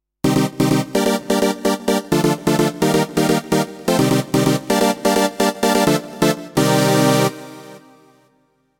yamaha tyros5 cool

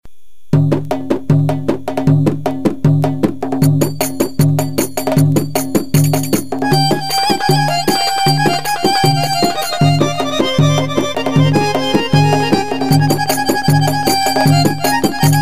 darbuka solo arap akordion; gw-8 ritimleri

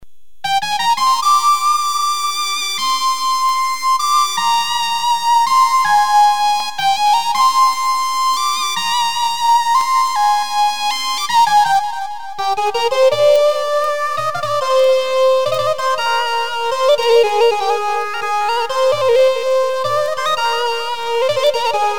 lead, gw 8

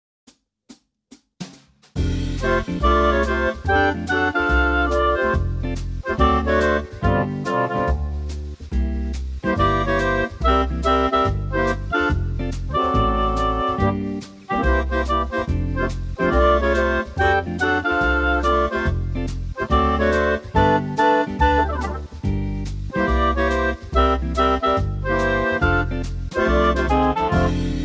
yamaha tyros5 ensemble woodwind

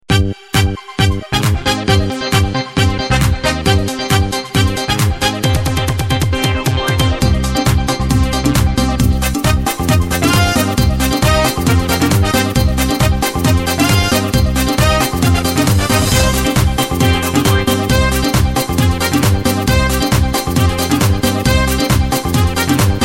latin dance

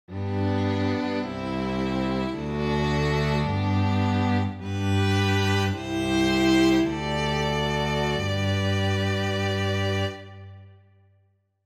yamaha tyros5 ensemble string quartet

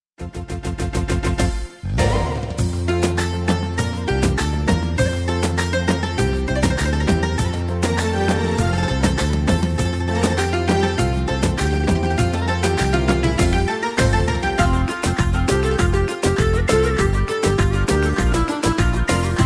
or700 style showcase arabic; yamaha psr or 700 orjinal rom

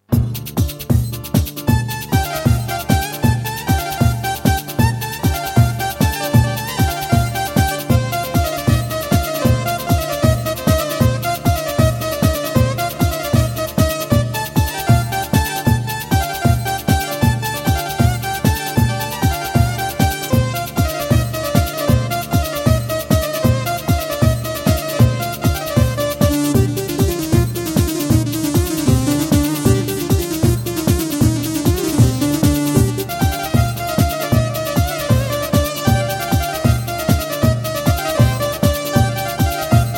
gem wk8; horun ledler

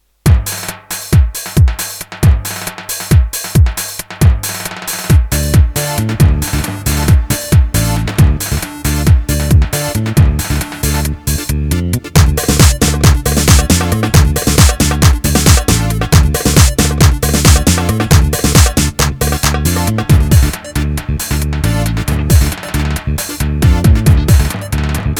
9-8 pop

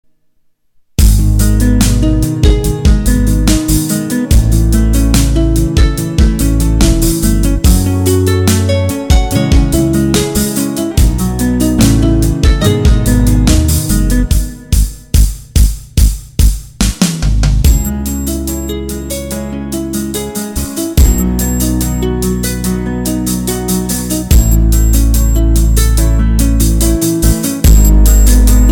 6 8 slow; roland gw-8 ritimleri

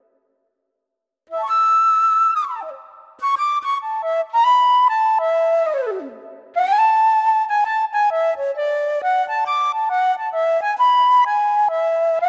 yamaha tyros5 classic flute

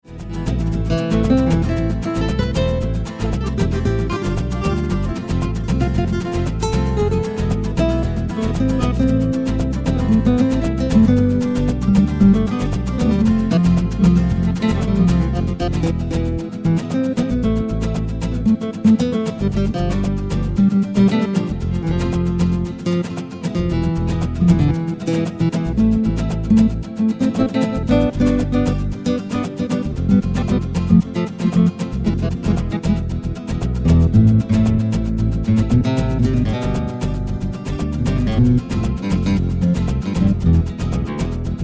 korg pa3x

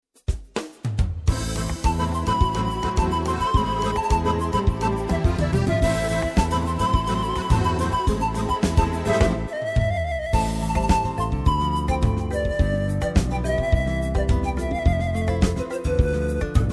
world sound showcase; yamaha psr or 700 orjinal rom

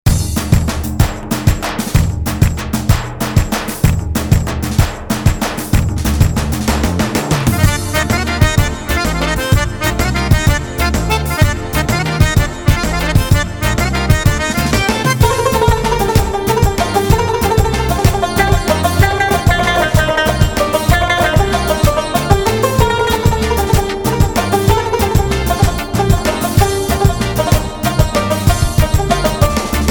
garmon tar; bk-5

